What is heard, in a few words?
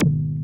Jug Synth; FX; Lost Tapes From Mars